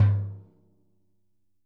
XKICKS, Living Drums; Sampleheads; Peter Erskine Living Drums

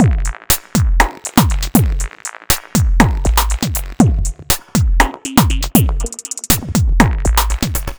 Tek Hard Step, Drum Loop; Sonic Foundry; The Electronic Music Manuscript Collection; Drum Loop Structures

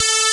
P5 GUITAR A4; E-MU Formula 4000 Series Vol. 3; Analog Odyssey

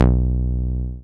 303, TB-303 BASS

